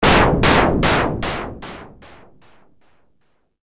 forge

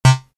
Triton, synth lead

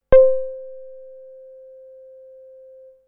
Basic Pulse, synthlead

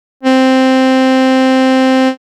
Woodwind, synthlead

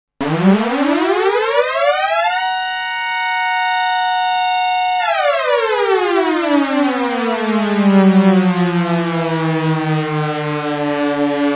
Outer Space Alarm

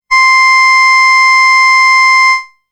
Oboe C

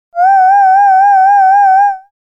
Opera, voices

